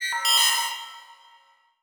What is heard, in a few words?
Beep Evolver